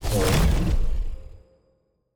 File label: Invisible Platform Disappear; TANK; 3Souls